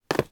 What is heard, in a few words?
Landing On The Ground